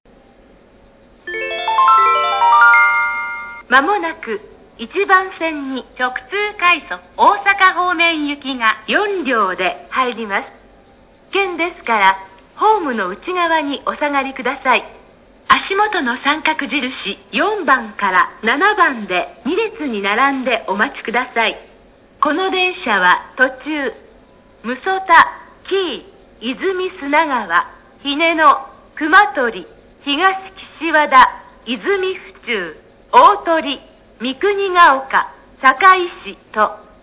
wakayama track1 directrapid kyobashi; nishinihon

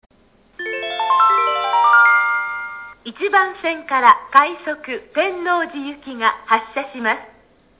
wakayama track1 rapid tennoji, eki; nishinihon

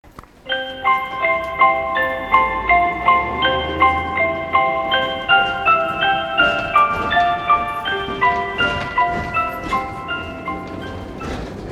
chime; hankai

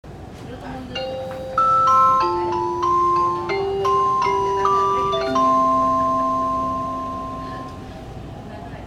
chime

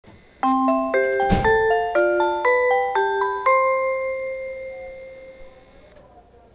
chime; odakyudentetsu

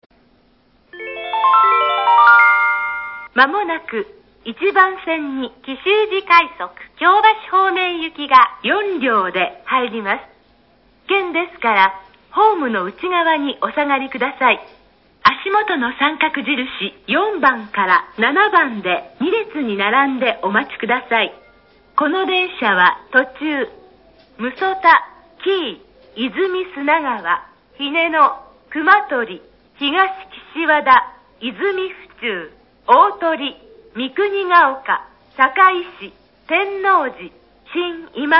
wakayama track1 kishujirapid kyobashi; nishinihon

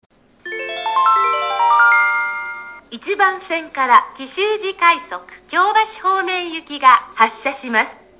wakayama track1 kishuji rapid kyobashi, eki; nishinihon

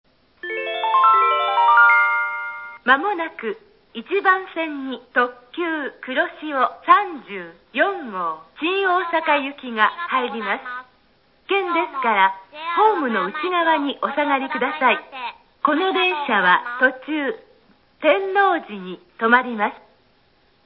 wakayama track1 kuroshio34go

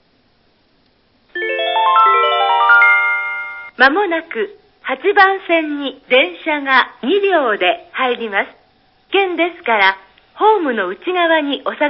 wakayama track8 local wakayamashi; nishinihon

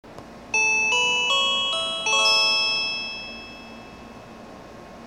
chime

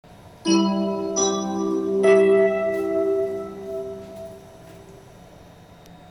chime; kyusyu

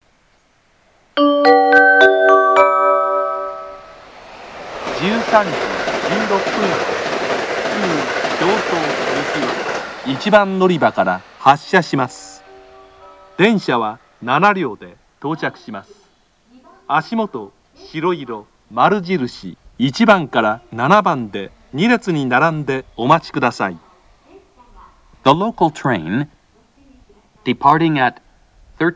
sumakaihinkoen track1 local kyoto; nishinihon

